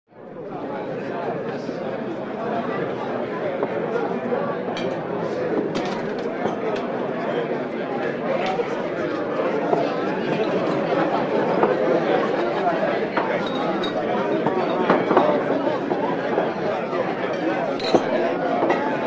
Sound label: Restaurant Large Large Crowd Busy; Interior Crowds